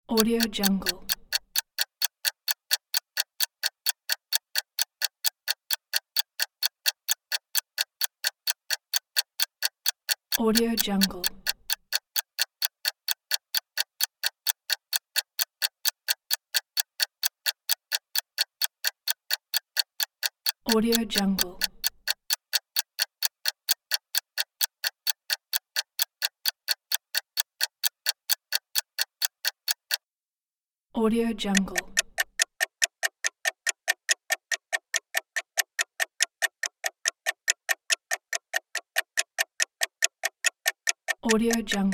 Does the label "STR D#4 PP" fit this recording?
No